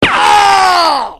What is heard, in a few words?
death; hl2dm